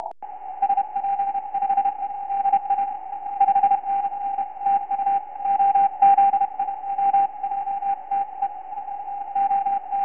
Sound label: 20m cw; N8S KH8S